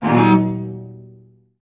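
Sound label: cellos D; Best Service; Peter Siedlaczek's Advanced Orchestra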